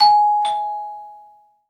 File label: bell, Doors; CosmicRage